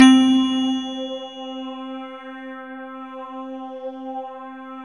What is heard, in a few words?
Vulcan Harp; Best Service Gigapack 1